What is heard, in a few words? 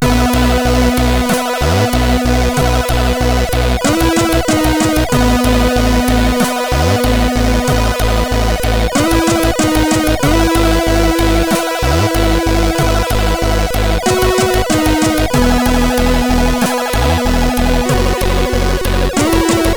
Hydrogenese loader sid CSG8580R5